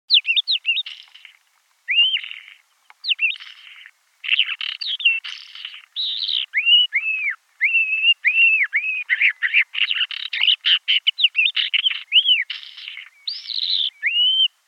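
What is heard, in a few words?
isabelline wheatear voice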